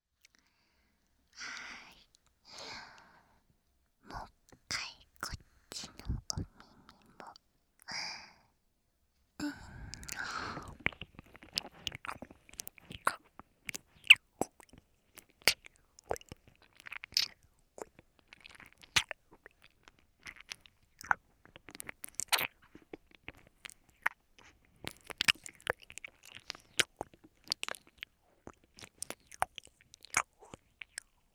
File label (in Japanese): ぺろぺろ 左, ASMR